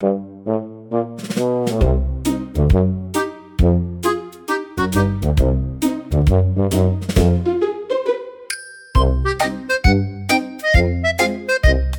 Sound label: sneaky pirate bounce; arrgh-hoops